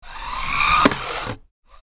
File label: RC toy car remote controlled impact; rc toys